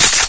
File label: ump45 bolt slap, weapons; Source Engine; cstrike